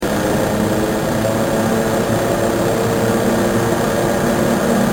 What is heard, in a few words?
clicking